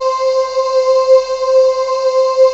VOX CHOIR HI; CMP - Analogon

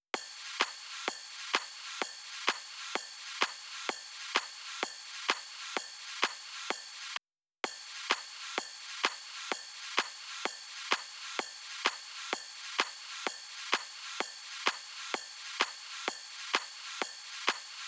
Drum Loop, 128BPM; VEC5; Drumloops; Multi Loops

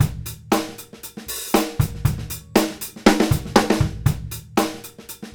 groove, Grooves 99-132bpm; E-MU Producer Series Vol. 8 – Platinum Phatt

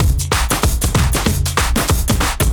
Trip Swing Mix A 95; off the grid

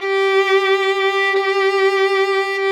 VLN G3; Sonic Reality; American Heartland

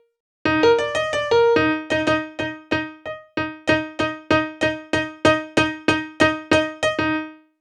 Piano Solo, Cinematic Funk; Sony Creative Software; Cinematic Funk Urban Tone Poems

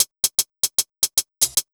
HH, Ragga & Dub Reggae; USB Soundscan vol.27; AKAI

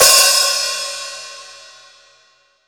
T HH OP, STUDIO HH

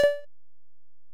Bell, Alesis DM-PRO

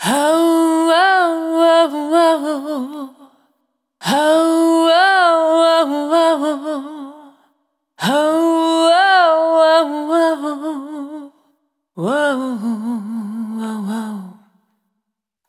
Single Voice Melodic Loops

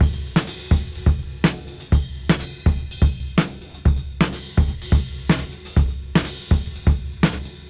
Disk 125 BPM; AMG - Keith LeBlanc Phat Butt Jams

